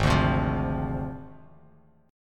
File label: Ab 6th Flat 5th Ab, Bass Standard; strummed; JGuitar